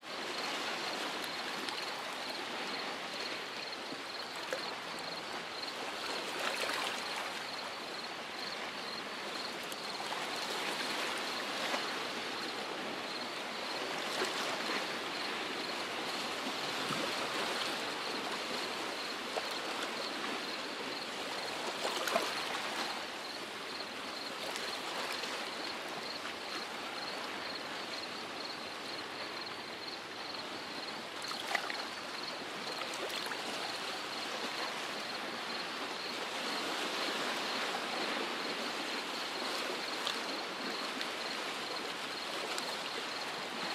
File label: Radiant Summer Beach Waves Ambience